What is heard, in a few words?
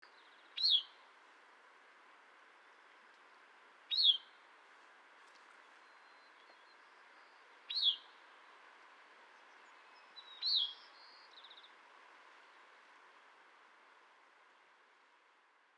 Gray-cheeked Thrush Catharus minimus; thrushes thrashers